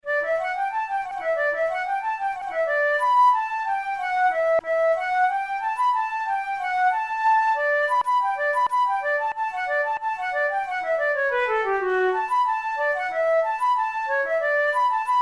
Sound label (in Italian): capricci per flauto traverso; ruge